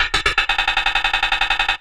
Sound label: WOODPECK, Anime Sound FX; COMIC